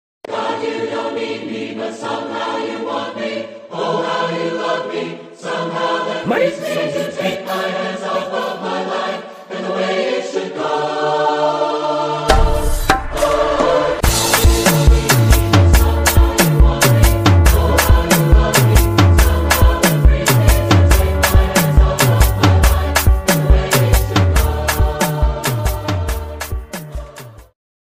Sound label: Beat, Choral